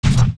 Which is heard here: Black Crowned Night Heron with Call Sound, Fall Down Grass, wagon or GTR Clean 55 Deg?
Fall Down Grass